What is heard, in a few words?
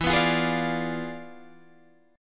E 7th Flat 9th Guitar Standard, chord